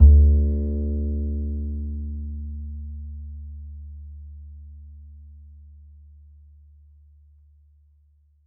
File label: E, Upright Acoustic Bass